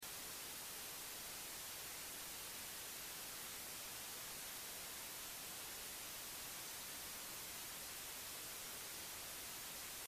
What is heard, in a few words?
TV Sounds; don t be afraid the first toy